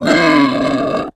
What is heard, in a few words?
Boar Die; Creatures